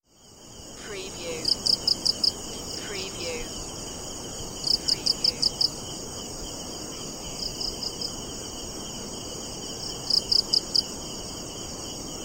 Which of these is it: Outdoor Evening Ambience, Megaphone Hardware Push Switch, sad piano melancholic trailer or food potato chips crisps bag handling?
Outdoor Evening Ambience